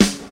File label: nineties studio pop folk snare sound g key, acoustic snare sample; snare drum samples